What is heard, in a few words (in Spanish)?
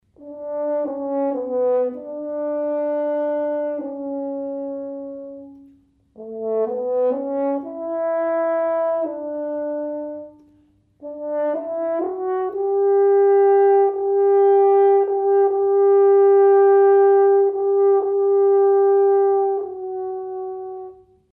trompa